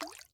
滴水石锥水滴入炼药锅声

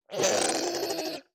炽足兽撤退声

